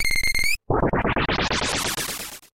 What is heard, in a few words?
magic summon